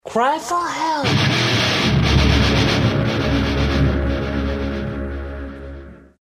Riff

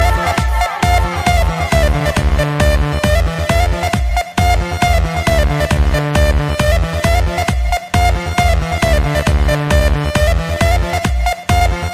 Best House Sound

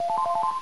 Login Sound; MMX

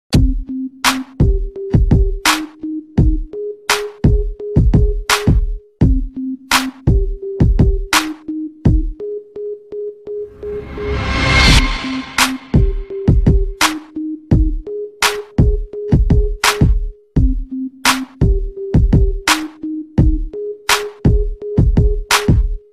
Hip Hop Bass Bip

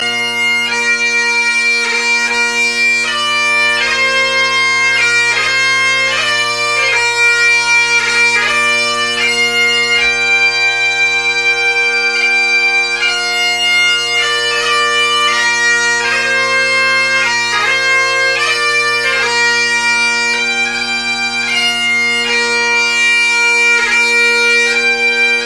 Auld Lang Syne

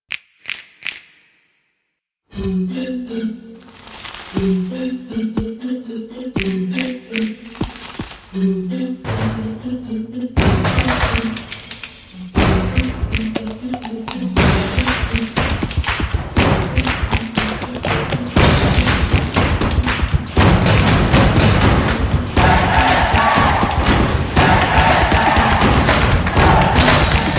Sony Ericsson W350i

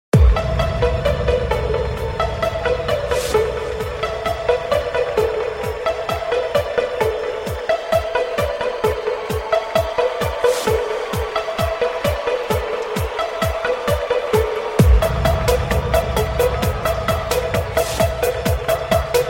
Grooves

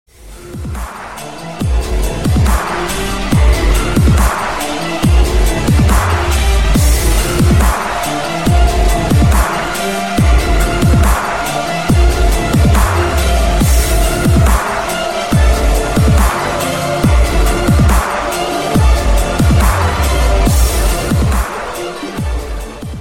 Saw Dubstep Remix

